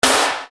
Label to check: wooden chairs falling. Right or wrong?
Wrong